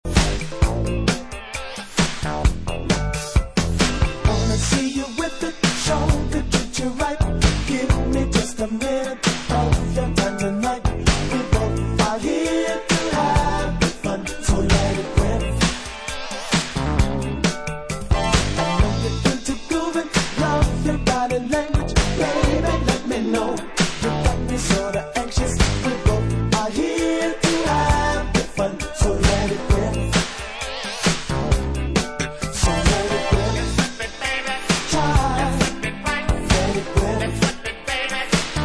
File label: vocal